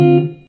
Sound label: mellow jazz guitar, Chords